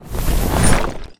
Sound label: Guardian Rock Shield Activate